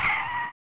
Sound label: skid; mad cars